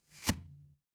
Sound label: impact bow